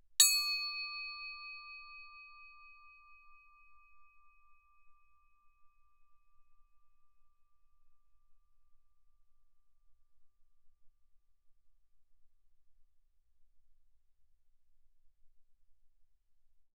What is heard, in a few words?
crotale D6 ff; Percussion; crotales